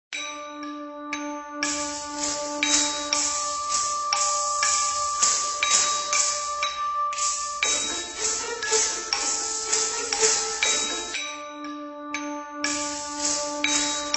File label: Walrus Ditty; Alice: Madness Returns unreleased original soundtrack